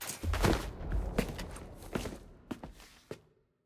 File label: archive return click; jinx